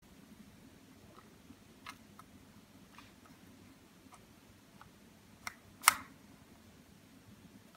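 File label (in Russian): zvuki pulsoksimetra, pulse oximeter sounds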